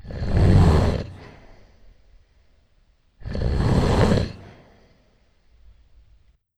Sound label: Shutter Snore; Legend of Keepers (gamerip) (2019)